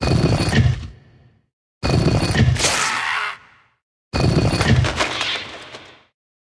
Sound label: bonusgame; bloodsuckers